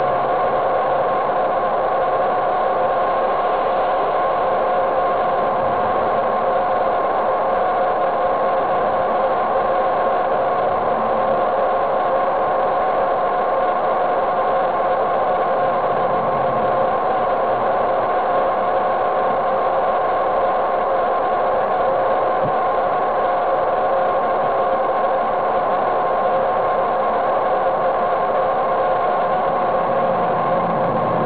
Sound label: Ductile 994ft 6in dia, Water Leak Detection